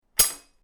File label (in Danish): magnet Kniv